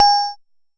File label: Jomox MBrane, drum machines; Jomox MBrane 11